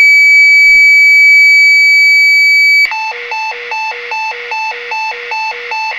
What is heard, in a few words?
tones